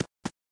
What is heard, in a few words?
footstep run loop